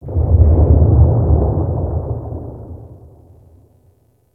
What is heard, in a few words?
Ice Distant Ice Break Large; Warframe; Corpus Ice Planet; Ambience